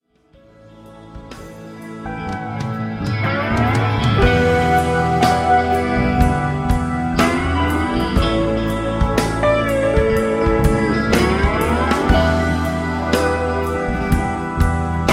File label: reverb